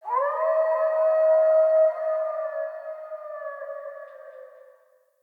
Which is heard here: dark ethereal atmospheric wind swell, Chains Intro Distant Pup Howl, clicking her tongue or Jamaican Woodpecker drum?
Chains Intro Distant Pup Howl